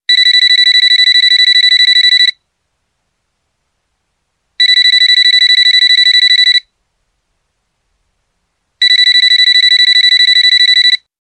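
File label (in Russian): Radiotelefon, radiotelephone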